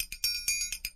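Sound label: triangles, drum loops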